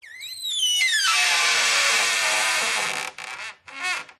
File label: Wood Stress Creak Squeaks; DPA4060; pmsfx